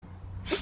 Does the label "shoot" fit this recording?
Yes